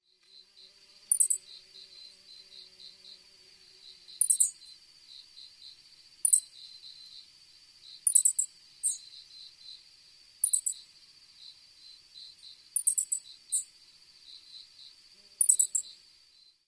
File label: hoojiro; ホオジロ; 地鳴き